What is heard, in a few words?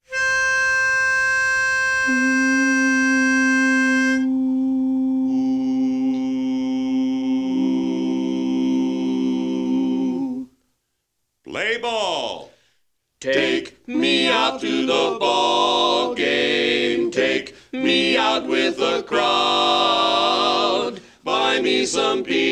lead, A Capella